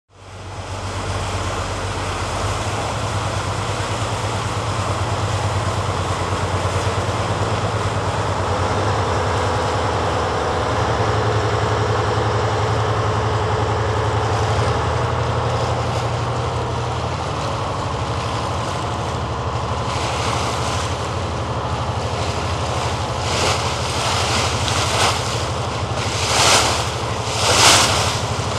Boat with Engine; Run ( Ext. ); River Launch With Nice Backwash.; powerboat